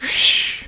snoring; foreman spike; SM64 CO-OP

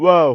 woah; SM64 CO-OP; Bob's Burgers

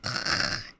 snoring; birdo; SM64 CO-OP

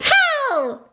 ground pound wah; daisy; SM64 CO-OP

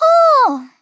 Hoo; Teto; SM64 CO-OP; Triple Baka Pack

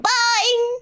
twirl bounce; birdo; SM64 CO-OP

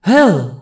punch hoo; rosalina; SM64 CO-OP

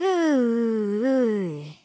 Yawn; Teto; SM64 CO-OP; Triple Baka Pack

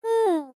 Panting; Miku; SM64 CO-OP; Triple Baka Pack

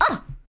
ooof; birdo; SM64 CO-OP

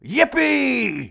yahoo; foreman spike; SM64 CO-OP

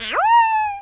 yahoo; yoshi; SM64 CO-OP

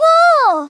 Woah; Teto; SM64 CO-OP; Triple Baka Pack

